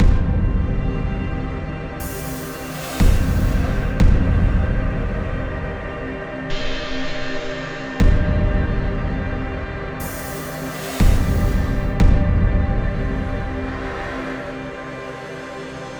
Danger Tonal; Insanely Twisted Shadow Planet

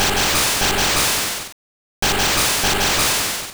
Hydro Pump; sfx; attack moves; pokemon; gen 1; rby

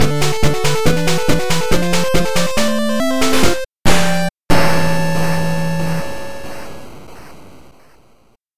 Level Win; Mibibli's Quest

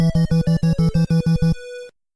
Player Miss; Castlevania

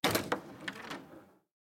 Door Open; hello neighbor pre-alpha alpha 1

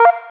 sfx menu in; Playman Extreme Running

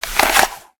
Use Equip; endless world idle rpg gamerip 2019

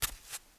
Step concrete barefoot

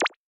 Show Award Bubble; boomerang fu

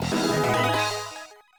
Coin Inserted; Ultra Street Fighter II The Final Challengers; 2017 switch gamerip

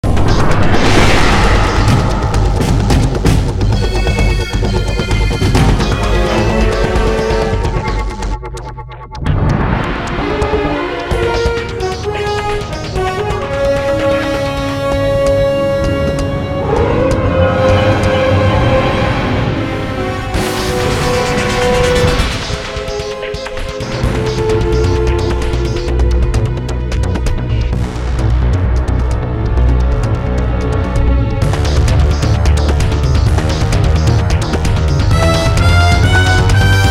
Bomb Drone Battle; ben 10 protector of earth ost

